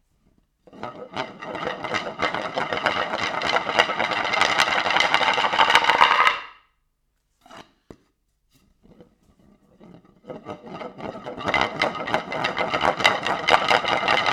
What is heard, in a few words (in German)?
Holzplatte accelerando mix